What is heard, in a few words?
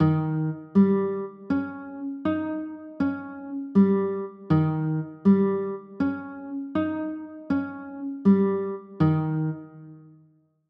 e flat 7, chords